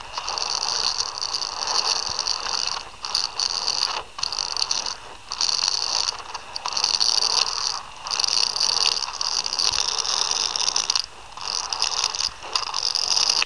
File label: Rollerblade Sound